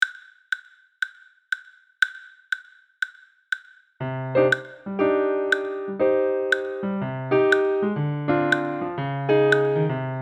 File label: Bb piano, sax